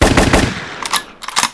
트리플액션, effect

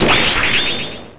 변신

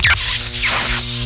전기

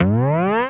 점프

